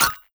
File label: Less Sparkly Menu Button Click